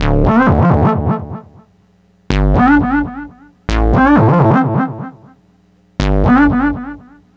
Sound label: NRG Lead, trance